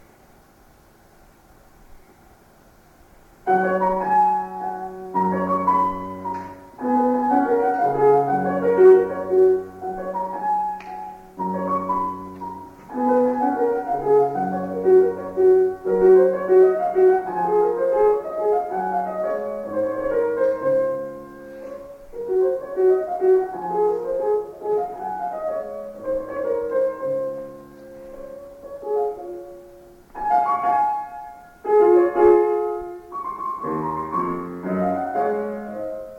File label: piano